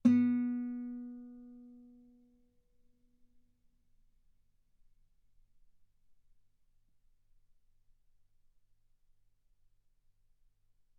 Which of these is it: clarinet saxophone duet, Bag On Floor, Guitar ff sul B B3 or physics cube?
Guitar ff sul B B3